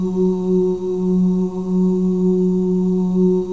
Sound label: BGV OOS, M+F OOS; Spectrasonics; Symphony of Voices